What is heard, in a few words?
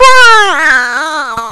death; faerie